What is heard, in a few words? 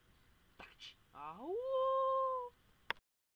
auuuu